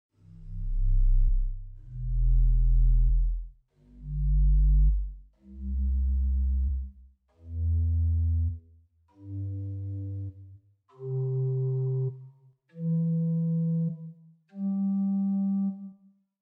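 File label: Pedal Subbass 16 arp, organ stops; Grace Lutheran, Champaign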